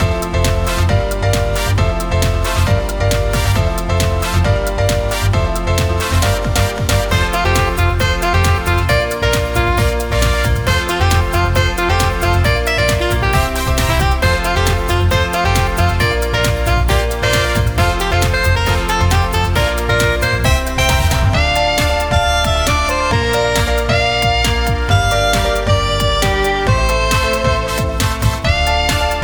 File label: sunny city climax bpm135